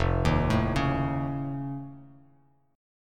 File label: F Augmented 7th F, Bass Standard; arpeggiated; 1,6,7,6; down